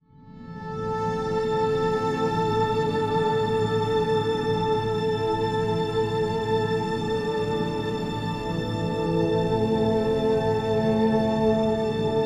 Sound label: music failure; freelancer-hd-edition